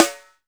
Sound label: SUPER PIC, Drum Mashines, SR-16 DRUMS; EdgeSounds